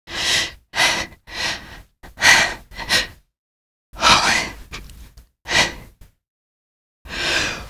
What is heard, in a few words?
dark breathing